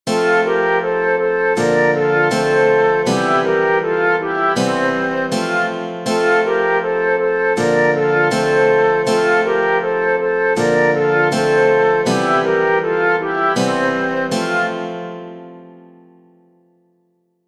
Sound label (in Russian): gitara